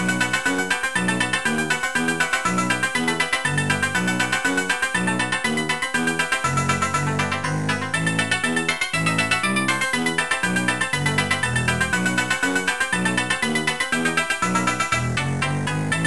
Korobushka; Level 5; Tetris; Spectrum Holobyte; Macintosh Version

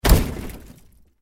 Metal Hit Large; monster truck destruction; android

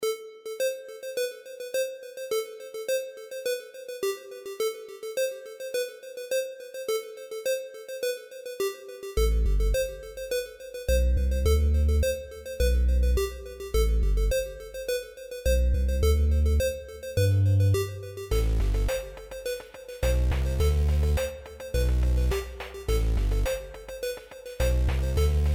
Dungeon; Box Maze

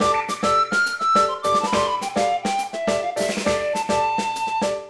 Nest Dungeon normal intro; vivid knight switch windows gamerip 2021

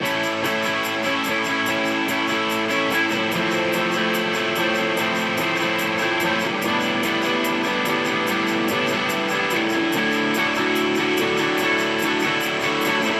opening song; Necrobarista Final Pour

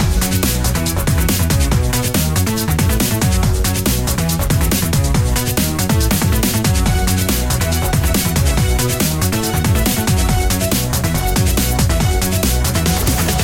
Music Main Menu; Sonic Momentum; SAGE 21

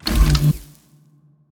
Ui Place object ground Stereo

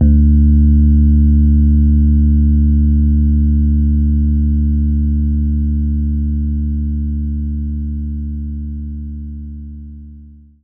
Rhodes D1

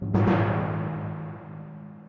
Tymp

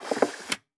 case Attach Non Metal

